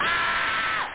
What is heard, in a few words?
ahhh